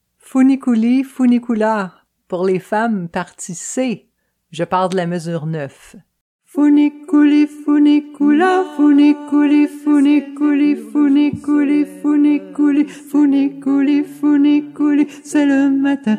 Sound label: Funiculi Funicula Femmes C, chorale; Adult choir